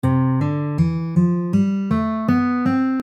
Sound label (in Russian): Naturalnyj zvukoryad v muzyke